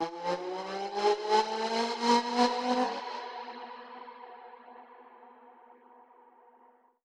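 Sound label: alarm siren, reverb processed, 170bpm; musicradar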